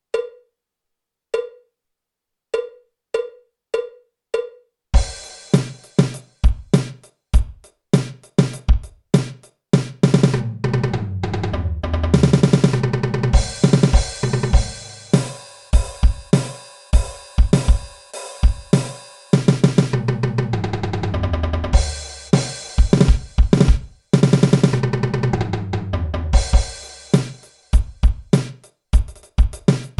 架子鼓独奏 芬克摇滚风格; 66条架子鼓独奏SOLO

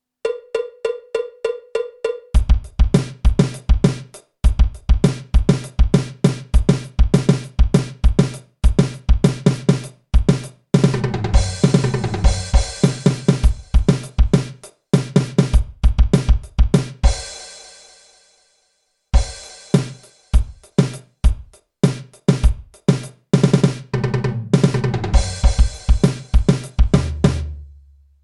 架子鼓独奏 八七拍; 66条架子鼓独奏SOLO

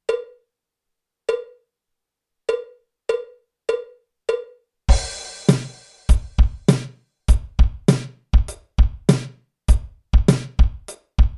架子鼓独奏 摇滚融合; 66条架子鼓独奏SOLO

